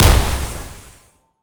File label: mech turtle explo; monster